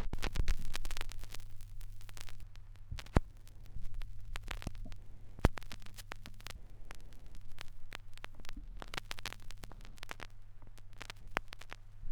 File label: crackles, Vinyl